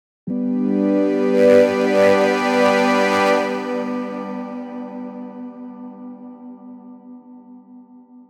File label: Trance, jup8; Lysithea